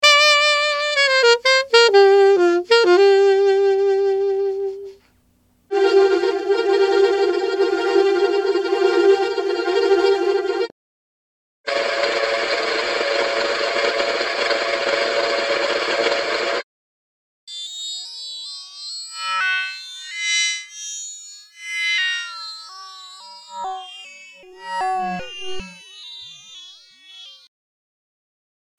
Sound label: Sax